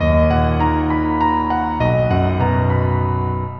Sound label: call ringtone; Nokia; 770 Internet Tablet